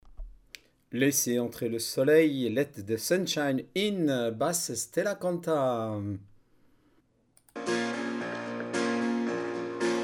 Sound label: let the sunshine basse